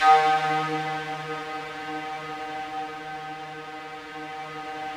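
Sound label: FOG ATTACK D#2 Left, Yamaha VP-1; Music Store; 90's Sample CDs; FOGATTACK 3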